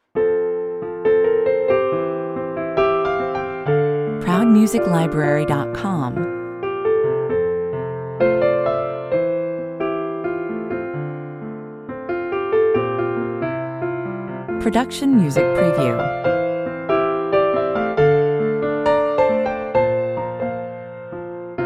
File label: piano mood, 110 bpm